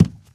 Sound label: wood, footsteps, walk; player; source engine; left 4 dead 2; boomer